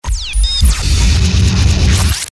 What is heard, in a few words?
Stab A Lick, Stabs & Bursts; Best Of N2O Library